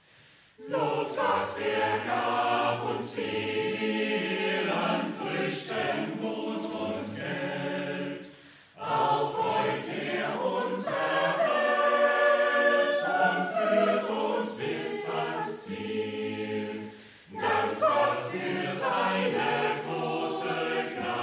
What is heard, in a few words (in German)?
Chor; Lobt Gott